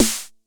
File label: Snare 909 Tune19; gate experiments